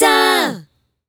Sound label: W Dip A, Vox One Shots